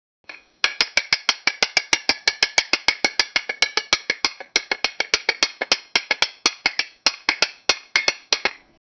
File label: dumbbell training sound